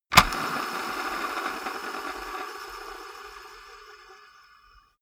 gaming mouse wheel scroll smooth hard

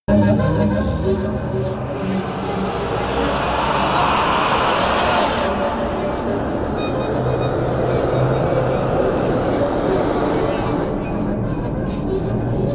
atmosphere attacking high

